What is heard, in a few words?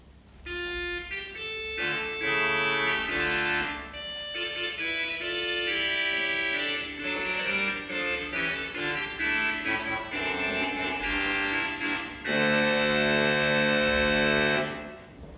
dulciaan, orgel; assen